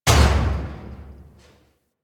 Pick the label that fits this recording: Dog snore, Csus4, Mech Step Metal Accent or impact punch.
Mech Step Metal Accent